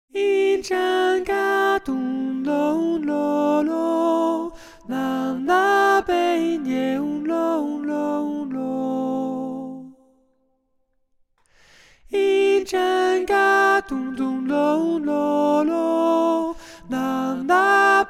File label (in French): soprane